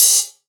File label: Ride, Cymbals; Vengeance Samples; VEH2